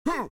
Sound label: Voices man fight shout; Out of Reach: Treasure Royale